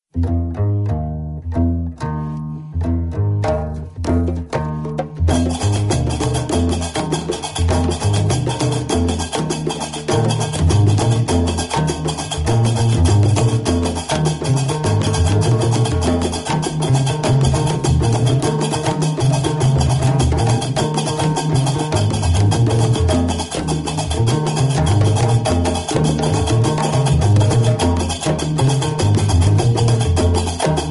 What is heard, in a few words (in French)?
Musique gnawa; Bruxelles